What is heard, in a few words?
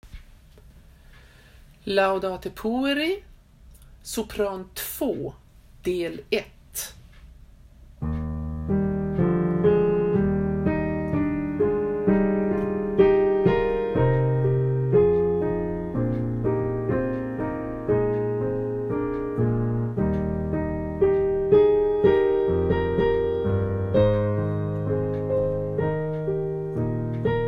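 Laudate Pueri soprano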